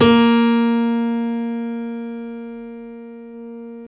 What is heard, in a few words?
Piano Grand; CMI